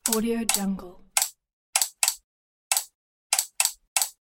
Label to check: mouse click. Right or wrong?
Right